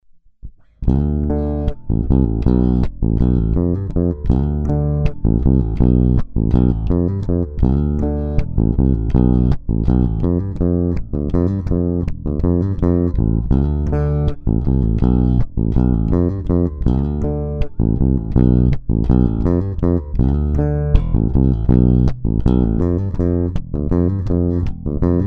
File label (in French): Tone Grave, Stingray